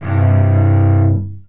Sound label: short strum, cello